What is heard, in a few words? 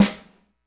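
snare drum, orchestra percussion; Greytsounds VOL-1; ORCH PERC 3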